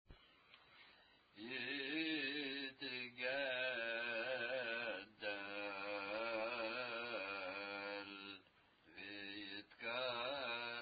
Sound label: Kaddish